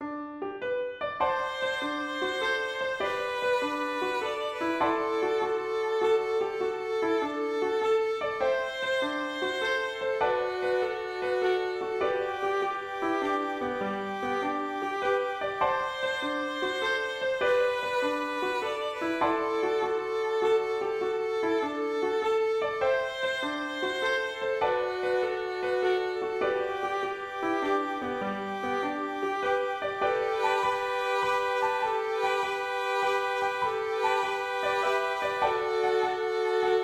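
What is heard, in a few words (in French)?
valse, melody and harmony; Kennebec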